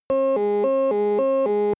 sci fi alarm small personal beep polyphonic

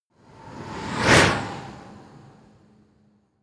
whoosh bicycle hard

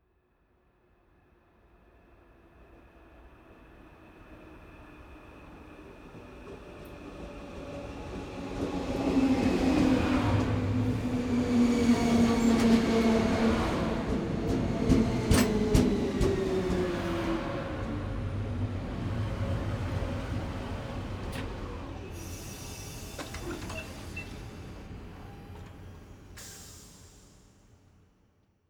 train passenger train arrive open doors

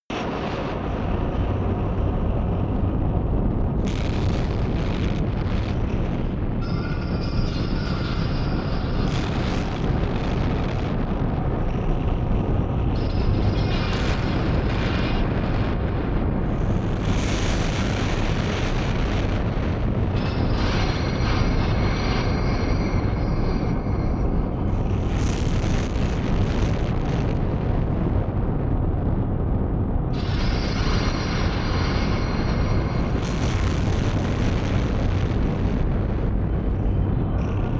underworld firestorm

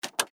fax switch

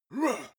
male shout hit soft

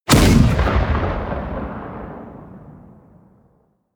artillery heavy close

